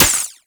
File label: Pistol Mech; Warframe; Sapient